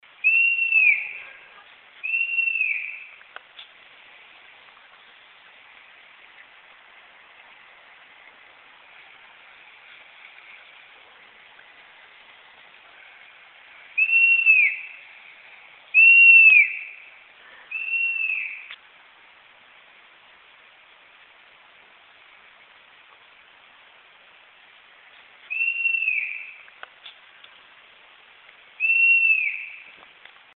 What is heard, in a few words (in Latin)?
asio clamator